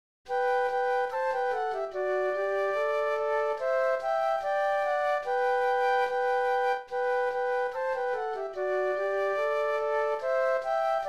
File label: Flute Duet; christmas